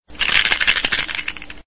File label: dice